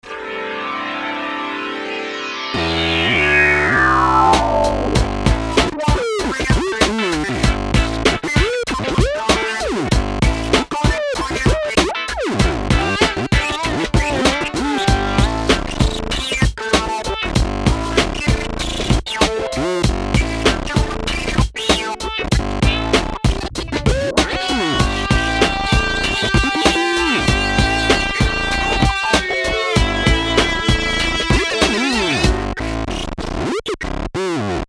nord wave osc funk

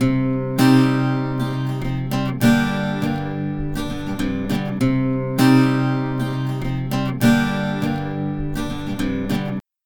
AC Guitar Dry; PerfectSpace

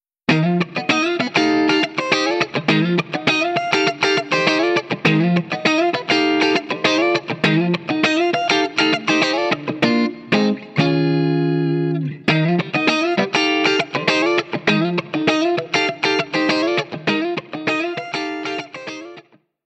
Straight Funk

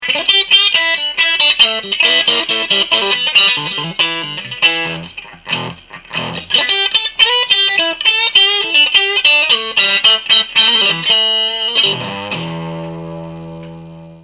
Spikey Tube Zipper

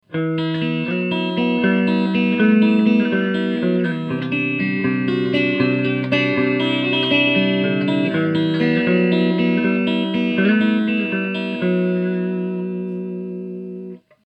Clean Les Paul; Red Witch

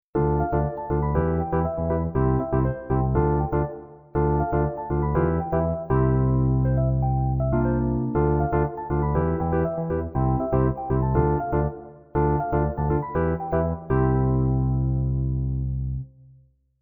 Wurly 200

